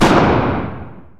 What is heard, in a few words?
revolver ext shot